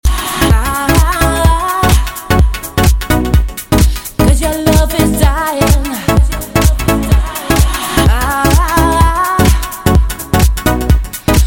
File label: Ska